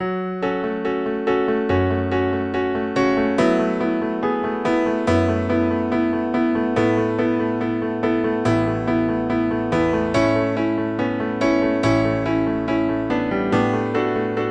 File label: Grand Piano